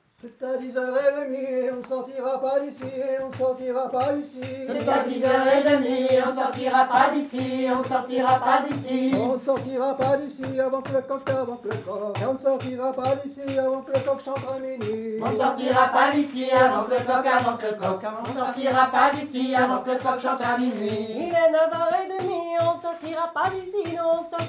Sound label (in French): chant; festival